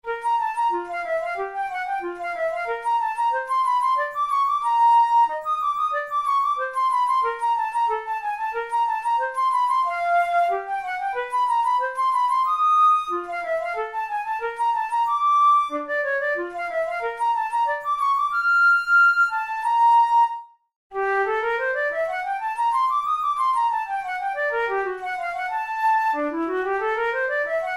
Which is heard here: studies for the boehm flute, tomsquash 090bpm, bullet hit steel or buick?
studies for the boehm flute